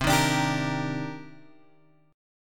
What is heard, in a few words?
C Minor 9th C; Cm9 Chord {8 6 X 7 8 6} strummed